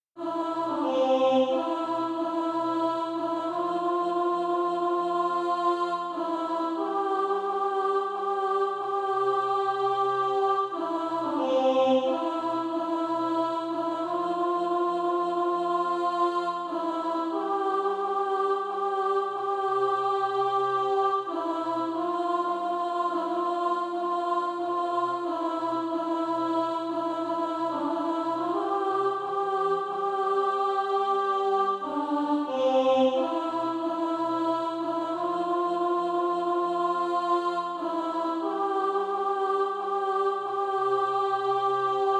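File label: alto, SATB Practise Music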